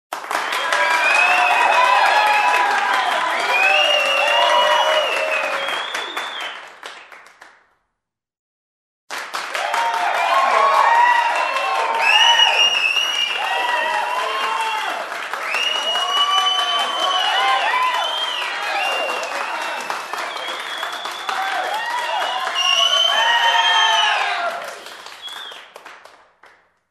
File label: Loud ovation from a small crowd indoors; standing ovation